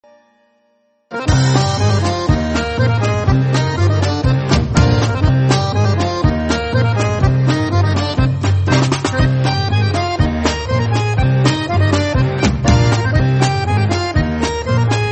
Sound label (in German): trio Spieldose